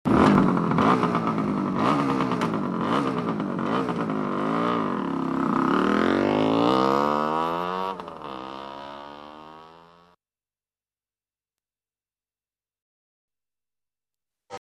moto

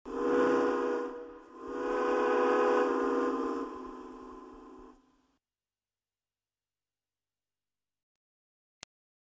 otro pito de un tren

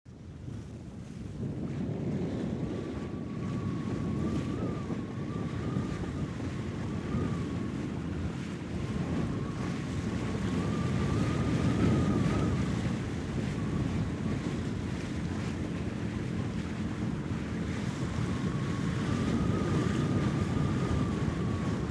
tormenta de viento

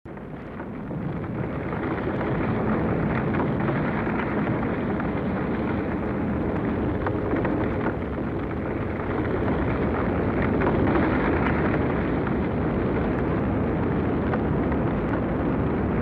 huracan

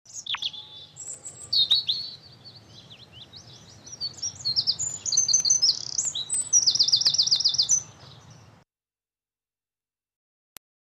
pajaritos

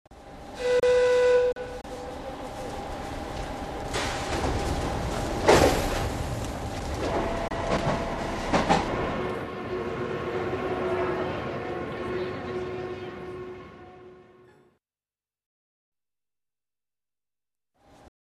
trenes

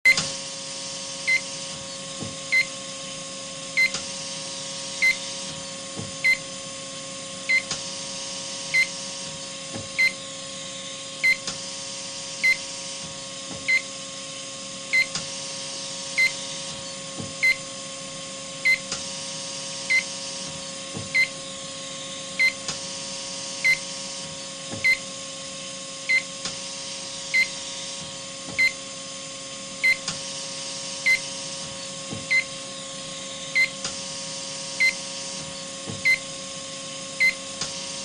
aparato de hospital

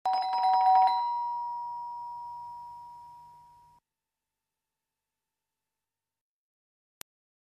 llamando a la puerta

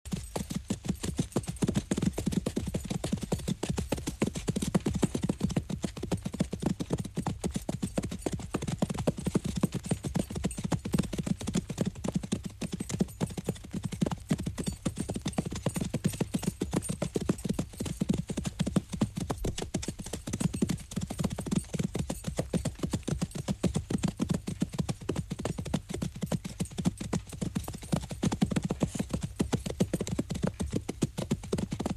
muchos caballos

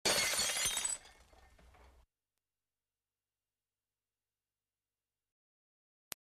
rompiendo un cristal

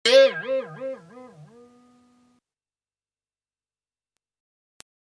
muelle

